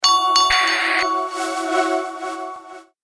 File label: Notification, ringtones sms